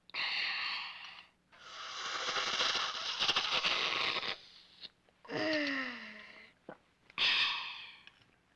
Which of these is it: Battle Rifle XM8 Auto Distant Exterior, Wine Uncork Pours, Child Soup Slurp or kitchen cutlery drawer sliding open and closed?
Child Soup Slurp